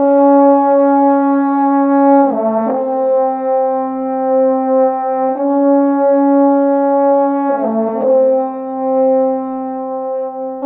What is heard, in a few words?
Horns, Cinematic; Sonic Foundry (Sony Creative Software); Orchestral Series VOL-3 - Cinematic